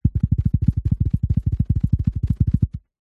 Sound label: cartoon animal scuttle fast carpet